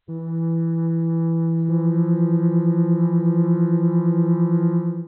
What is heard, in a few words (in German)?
flöte e1 f1; Intervalle, die man durch Potenzen von 2 und 3 darstellen kann